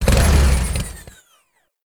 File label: Motorcycle, Boost Stop; Warframe; Vehicles; Motorcycle Ninety Nine